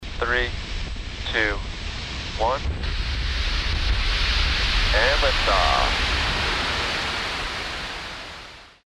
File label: SFX LIFTOFF